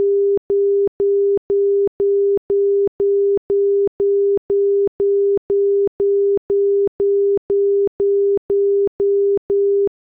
Aircrafts Cockpits Marker Outer; DCS

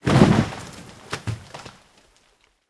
tree fall

